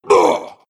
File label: pain; Brew; dota2